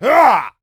warrior attack swing

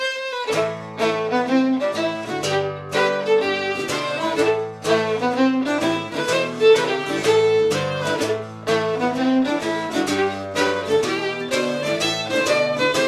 Orchestra Ambience; Assassin's Creed III

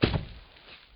Animatronic step; five nights at freddys final hours 2

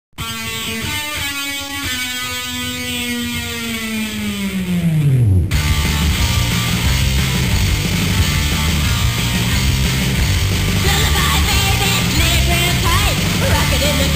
Lullaby; Total Distortion

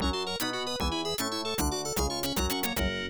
Bonus Level Clear; The Berenstain Bears Camping Adventure; Genesis

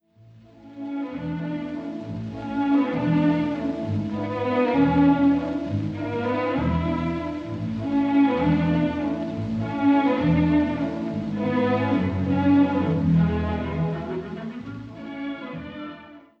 Swan Lake Waltz Bit; Jim is Moving Out

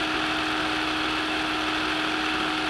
ELEVATOR Movement Loop

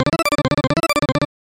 Unused Jingle; Super Double Yakuman 2; SNES

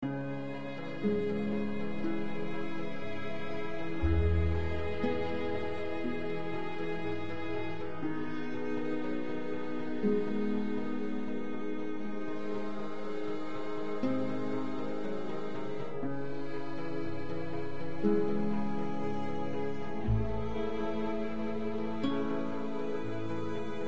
Neutral Linear Loop

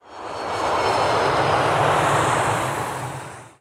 Landing; Pocket Planes; android

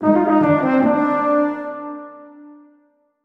Bad Jingle; Fire Emblem Echoes Shadows of Valentia

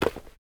Footsteps, Human, Tile, Run; backrooms 2d android ios online windows gamerip

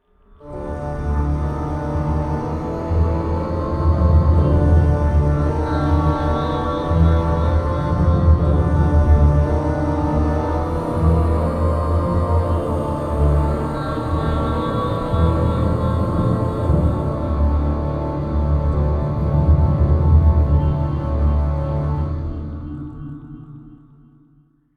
Lose Campaign; heroes of might magic v gamerip 2006; Dungeon

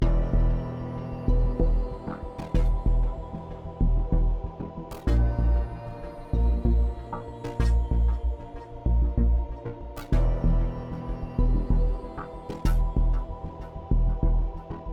Exploring Base Loop

